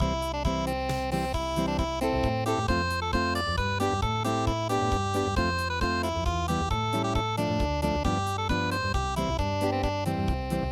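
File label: unplugged; midi traditional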